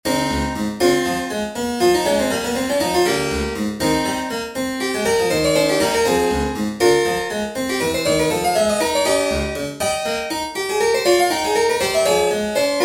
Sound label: Diasem Lydian Example 16edo